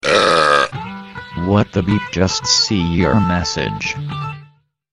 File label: Beep Msg Tone